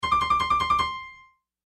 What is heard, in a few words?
Piano Trill Hard; Cartoon Piano Trill Hard Musical; Cartoons; Blastwave